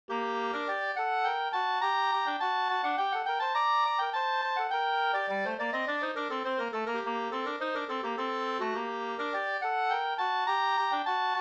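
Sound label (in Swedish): slangpolska, two-part; Stigtomta Sd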